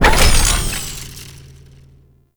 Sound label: Mech Shocking Iron Stop; Warframe; Nechro Mech; Abilities